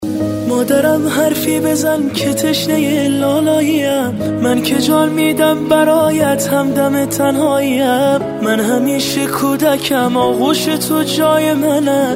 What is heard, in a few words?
Mobile ringtone